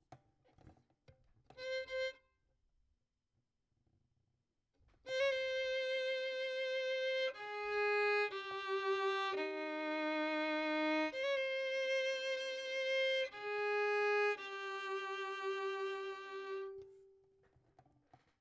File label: Violin; Verdigris Music